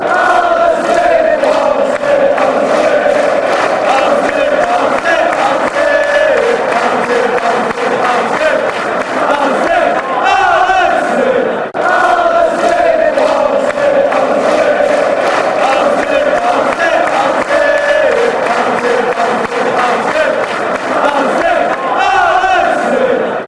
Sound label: fanchants; alan smith